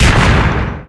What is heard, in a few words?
Blast; fun and bullets